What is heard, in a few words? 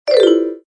You Lose Points!; ooh and aahs coco nutty bowling